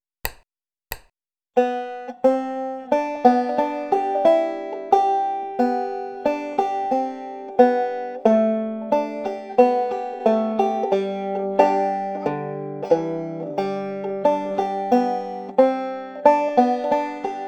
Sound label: wildwood flower index lead